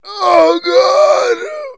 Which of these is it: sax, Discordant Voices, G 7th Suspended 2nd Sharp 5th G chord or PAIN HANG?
PAIN HANG